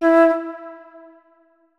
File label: flute notes; Hideout Furniture